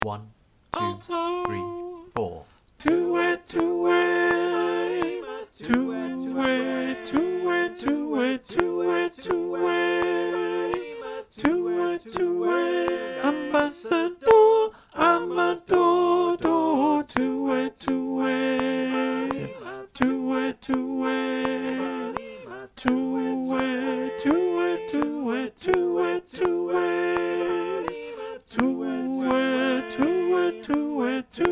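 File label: alto click, choir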